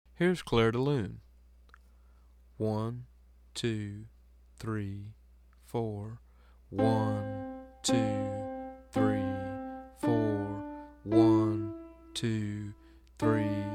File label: Slow